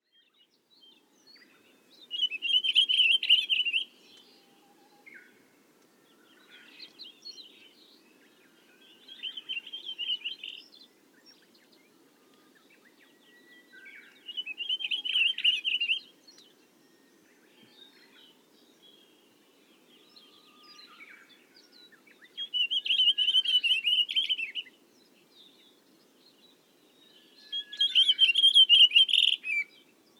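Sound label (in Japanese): ginzanmashiko, pine grosbeak; kamikawa; koe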